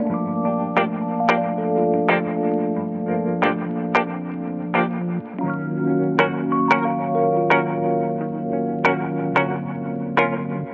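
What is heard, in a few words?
guitar and keys; loops; Phonky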